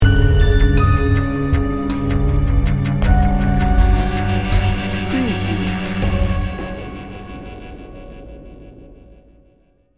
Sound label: crime stalker sting bumper